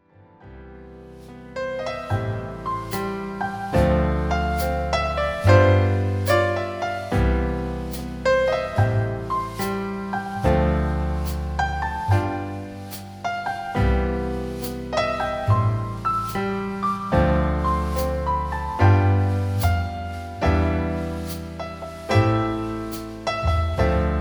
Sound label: Piano